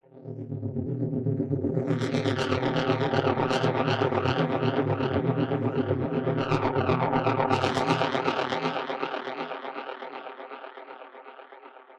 Noise

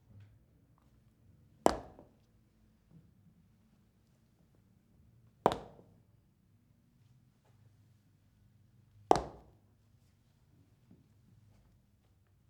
Steps

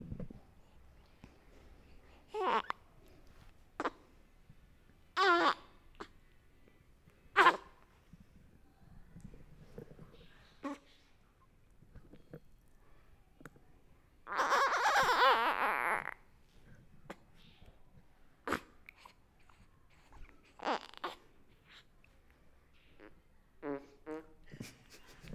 Newborn Baby Pooping Sounds

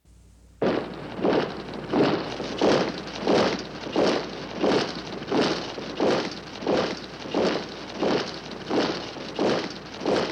Army Marching Steadily

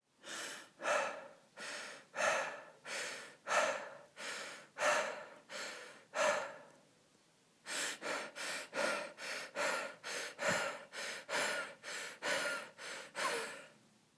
Panting

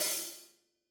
Hi Hat Open

